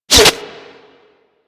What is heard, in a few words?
Bullet Default Hit; Warframe